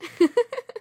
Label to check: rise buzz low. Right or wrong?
Wrong